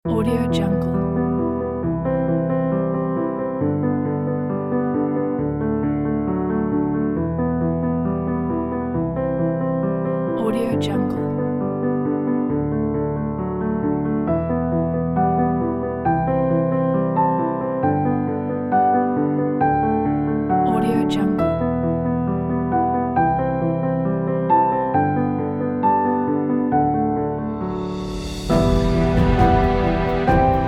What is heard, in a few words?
romantic